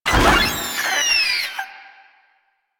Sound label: Maggot Attack; Enemies; Vocals; Warframe; Proto Infested